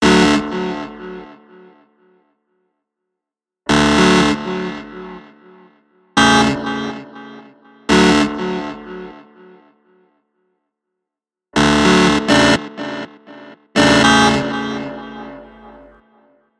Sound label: ring